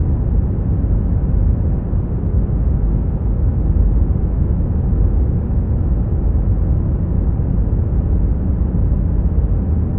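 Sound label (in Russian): стабильный приглушенный машинный или промышленный шум шумная атмосфера или окружающ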